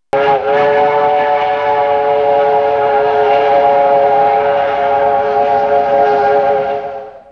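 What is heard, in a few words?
train whistle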